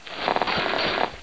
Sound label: crawl